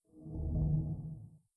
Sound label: Shutdown; MinderiaOS Blackcomb; Minderia Soundpack MinderiaOS; Mega WNR Pack Collection 2018-2022